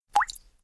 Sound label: Droplet open; Nokia; 8600 Luna